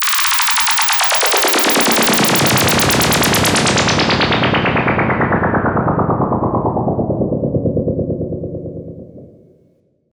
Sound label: fx descent